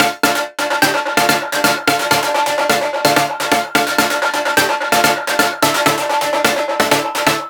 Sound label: synth 128 Dm; Electro; Anthemic; synth loops